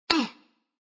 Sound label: shake